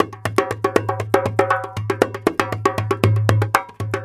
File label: Dumbeck, Percussion; Sonic Foundry (Sony Creative Software); World Pop